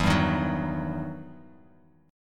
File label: E Minor Major 9th E down Guitar Open F; strummed; JGuitar